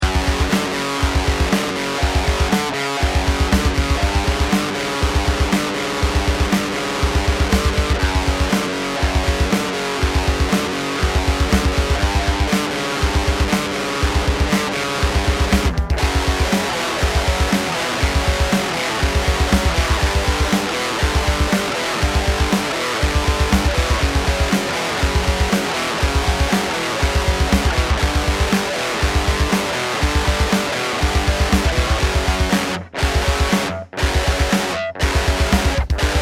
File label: Gloomy Riff